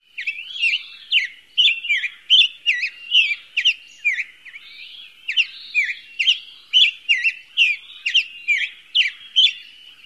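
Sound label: song, bird; AZ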